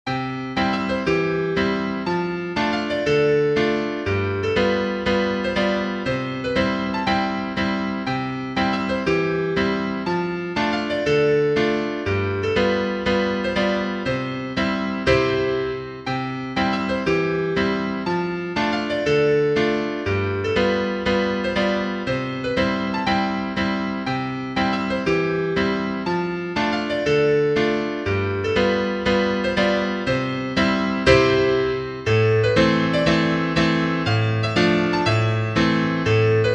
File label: piano, sheet music